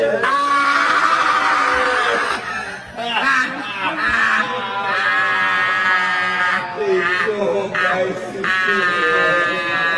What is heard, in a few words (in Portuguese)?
risada meme; cariani